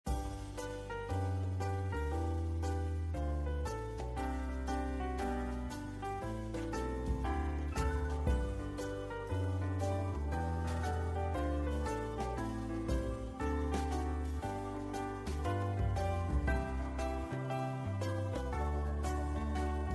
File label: back Music; RUMMY